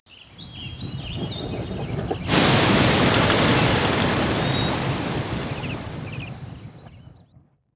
GYSER, buildings

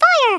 fire; worms a; English

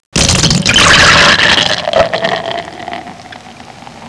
BEER, GLASS; Easy Personal Web Page

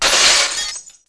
glass break; Hellboy

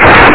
flyby

